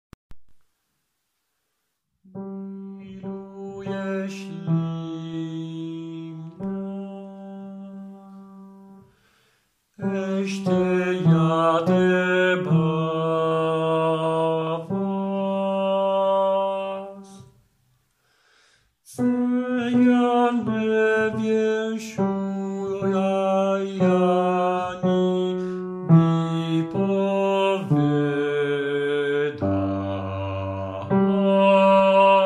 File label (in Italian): Laska opravdiva bassi